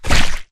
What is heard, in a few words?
Mvmt FS Jog Mud Layer; AI Stalker; Titanfall 2